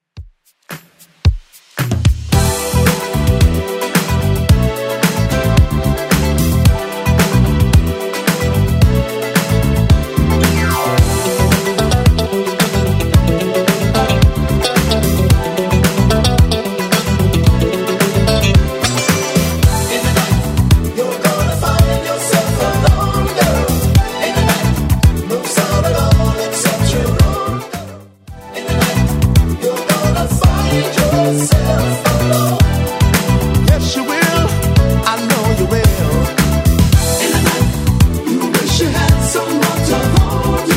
70s Redrum 111bpm

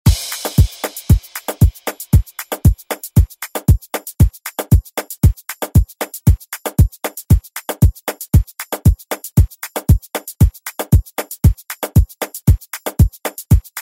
ReDrum; DJ Mhark

